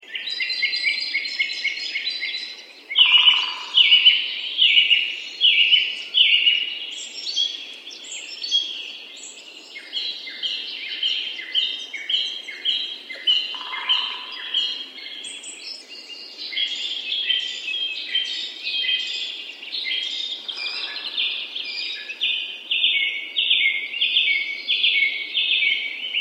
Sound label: forest day ringtone